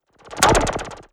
Cyber, sound effect transition

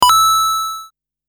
Game, transition

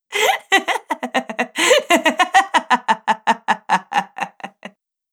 female cheerful laughte

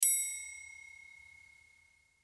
Cartoon, transition

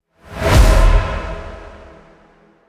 Cinematic, transition

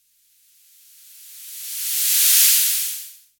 Swoosh

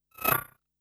Glitch, noise

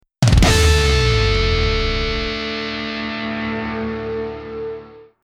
Rock, transition

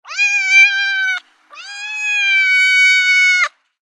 Cat loud meow; gorbe

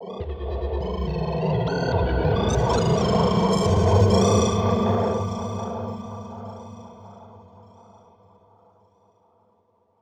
alien jungle world

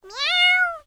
sweet kitty meow; gorbe

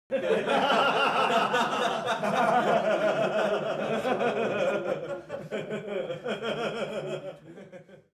small man crowd laughing

